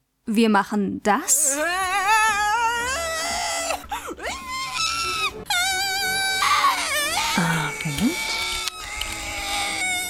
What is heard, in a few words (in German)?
Vocaltricks